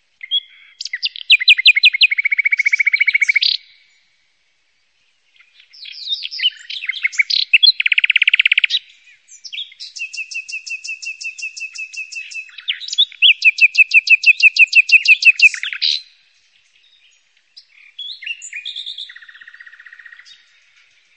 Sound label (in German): Stimme, Vogelstimmen